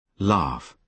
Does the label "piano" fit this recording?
No